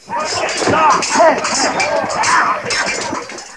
sword fight

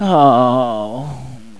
groan

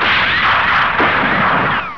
rifle shot echo

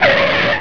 car skids, vehicles cars

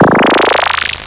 grow

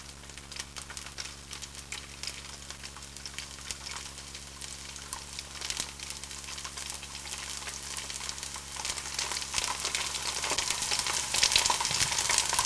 fry bacon

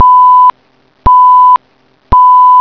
weather warning

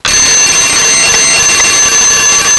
alarm, sirens

